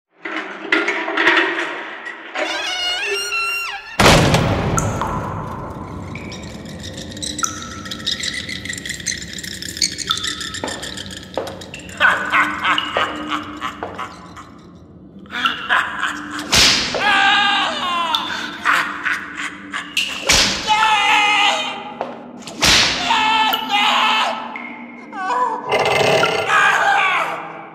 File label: torture chamber halloween horror scary sounds and music